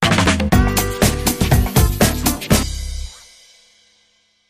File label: Sting